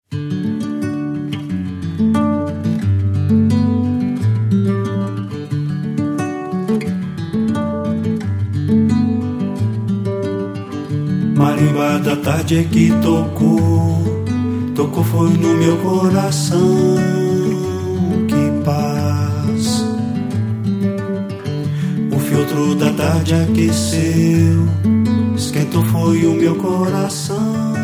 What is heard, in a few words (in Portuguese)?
voz violão